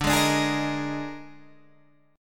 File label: D sharp Major 7th Suspended 4th D, chord